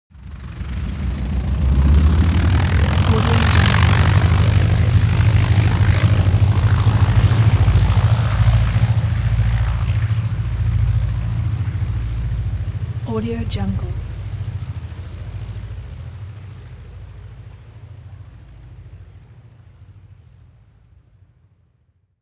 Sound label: transportation prop plane ju52 take off